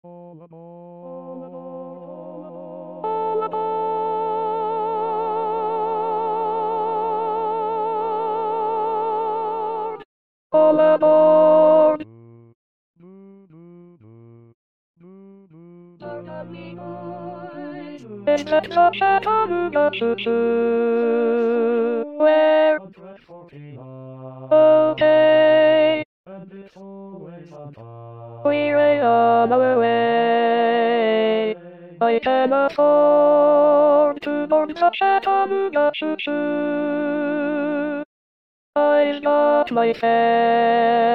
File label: alto